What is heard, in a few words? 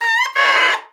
OBOE3; Xsample VOL-6